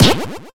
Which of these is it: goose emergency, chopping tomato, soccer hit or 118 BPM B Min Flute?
soccer hit